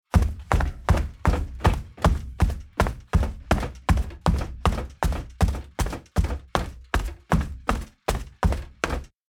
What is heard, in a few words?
Footsteps on metal ship deck running